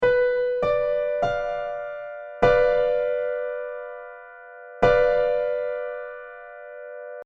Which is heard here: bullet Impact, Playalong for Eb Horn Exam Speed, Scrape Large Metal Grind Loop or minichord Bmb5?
minichord Bmb5